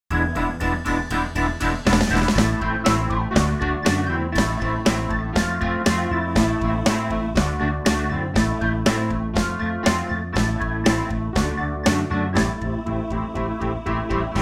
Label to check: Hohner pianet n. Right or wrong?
Right